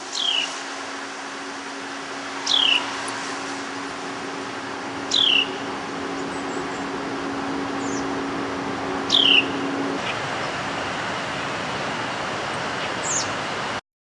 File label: Carolina Wren